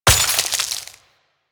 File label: Vial Ice Shatter; elements; Warframe; Warframes; Alchemist